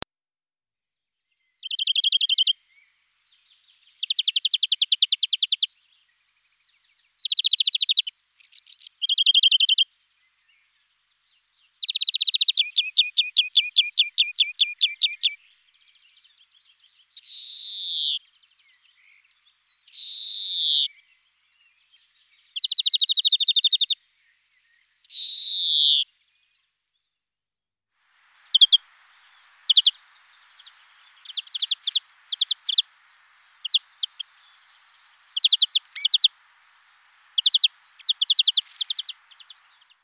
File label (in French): verdier d'europe, oiseaux